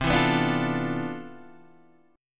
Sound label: C Major 13th C, Guitar DADGAD